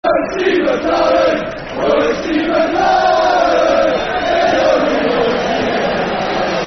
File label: Football Chants (Soccer Songs), Cardiff City